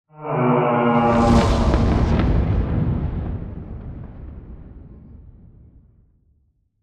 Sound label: Explosion Rumble Exterior Close Wash Tail; Boomtown; Titanfall 2